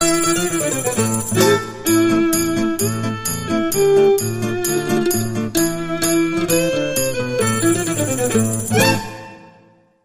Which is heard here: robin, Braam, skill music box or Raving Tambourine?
Raving Tambourine